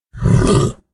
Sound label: pain